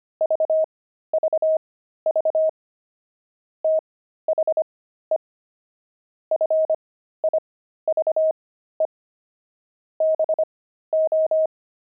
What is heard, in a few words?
pangram, intermediate pangrams files